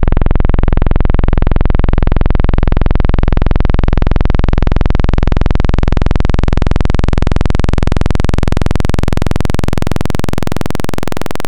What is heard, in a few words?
Analog PWM Saw, wavetable